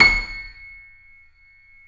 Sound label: C7 PP, The Ultimate Piano Collection; East West